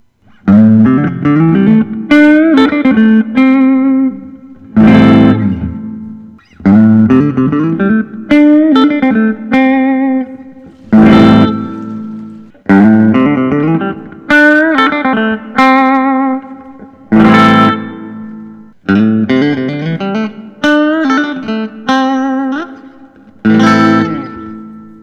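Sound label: Gibson Custom Les Paul Axcess Standard; 2008; ice tea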